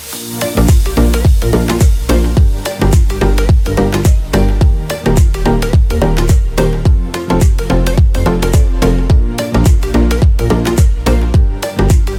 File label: VIVO V25 RINGTONE